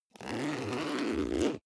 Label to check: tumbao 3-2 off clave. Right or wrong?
Wrong